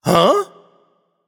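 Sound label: nonverbal surprised; smite; voicepack; merlin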